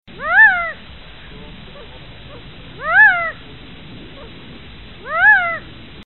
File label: quail sound; upland birds